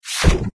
robotz restore down; windows 9x; sound schemes

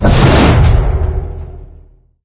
sled slip; ambient; source engine; ep2; outland

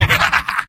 laugh evil; soldier; video games; source engine; tf; mvm

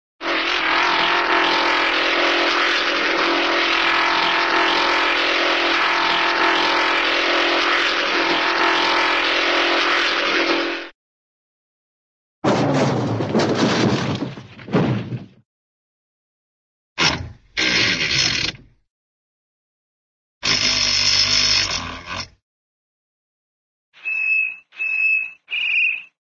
auto, race car, steady driving; cartoon

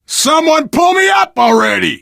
ledge hang, biker; video game

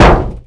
metal barrel impact hard; Source Engine; video game; physics

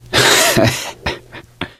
laughter, gambler, survivor voice

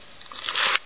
barnacle chew

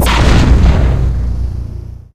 superphys launch; Source Engine; video game; weapons; physcannon

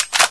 sg552 clip in, weapons; Source Engine; cstrike

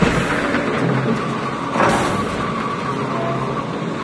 pump loop; ambient; machines; Source Engine; cstrike

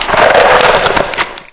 tentacle roar; Source Engine; bshift

